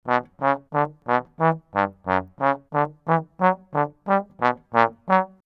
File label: 90 Bmin trombone; 90 s Sample CDs; PowerFX - Downtown Orchestra; Brass